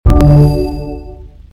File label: new email, ringtones